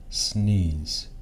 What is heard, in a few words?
sneeze